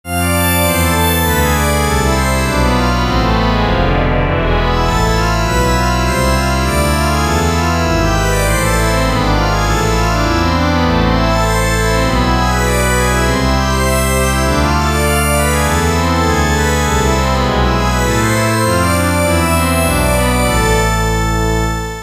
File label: organ practice